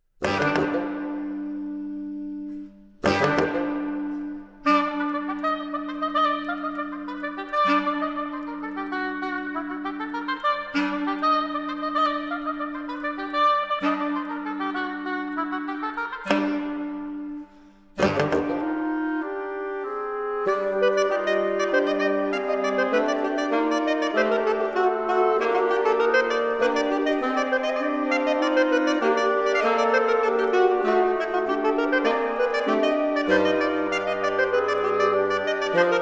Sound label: Motet